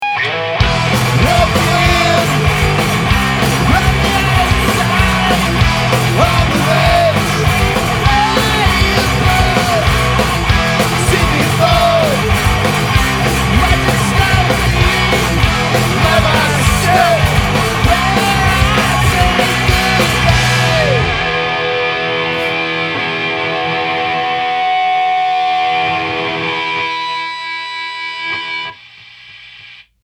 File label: chorus